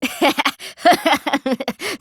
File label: laugh